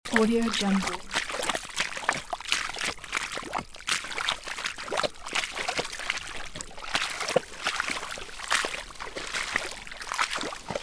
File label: footsteps in shallow muddy water loop; human sounds